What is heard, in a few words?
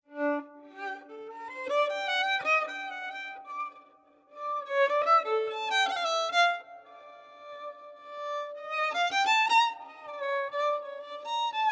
Improvisation